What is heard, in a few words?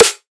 Hard Snare; Antidote Advent; Infected Track Drums